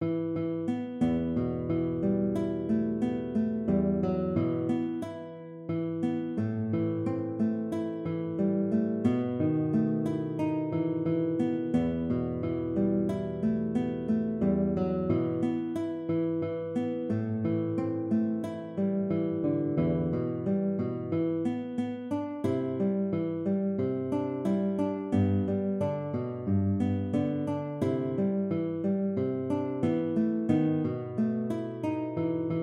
guitar

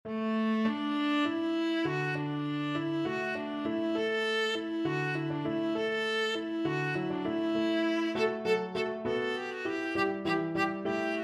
water music, viola

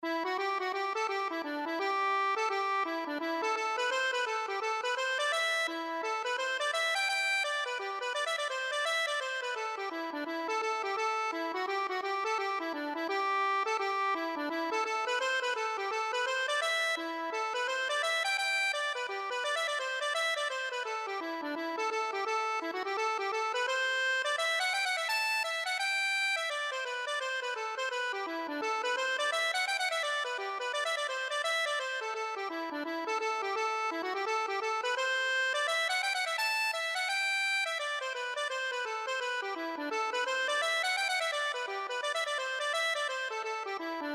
accordion

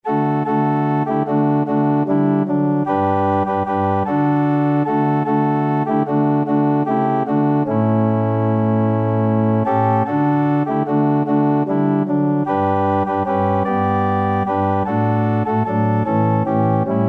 organ

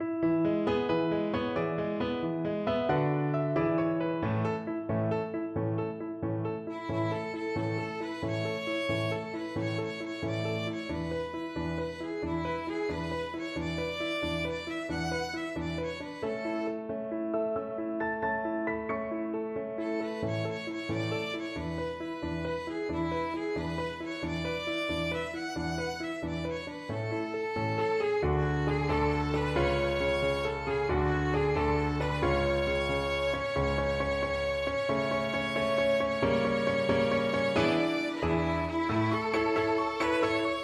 ocean wave, violin

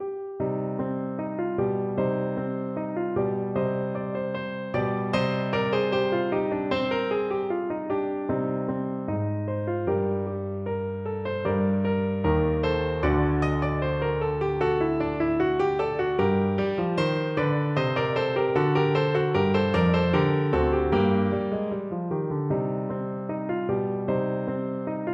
piano

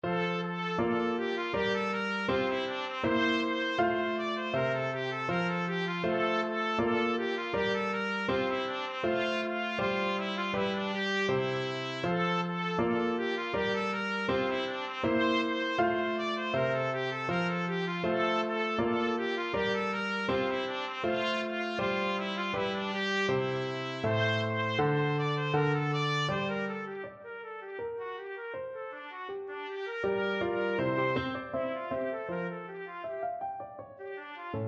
gavotte, trumpet; bach; cello suite 6

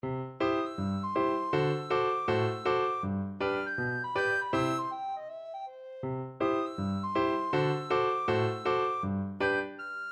beginners rag, recorder

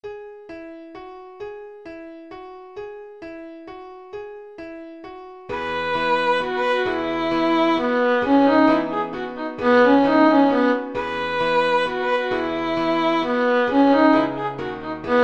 Carillon violin; Bizet

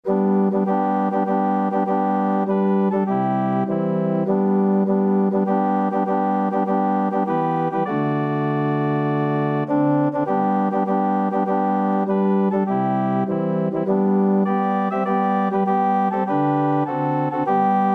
organ

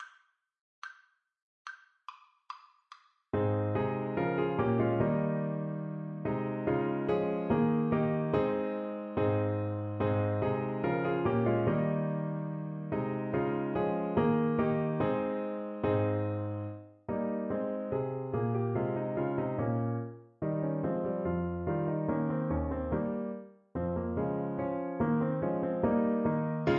Saxophone

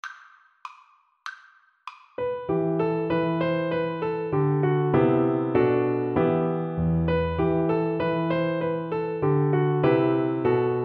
huron carol FL kar, Flute

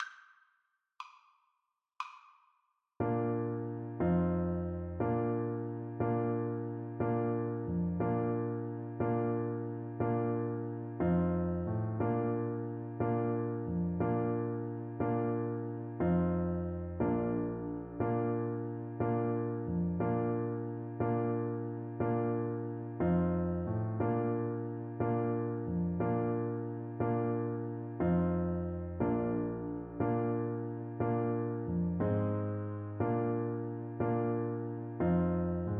another jig TPT

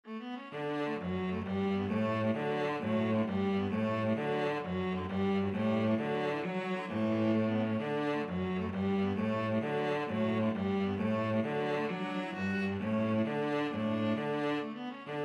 hornpipe, viola cello duet; fishers

